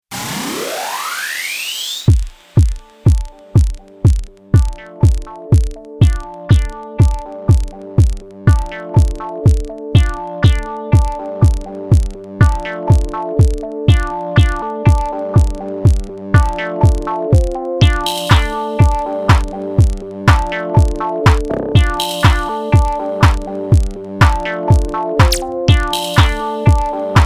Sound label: Elektronika Minimal Song; SampleTank 3 CS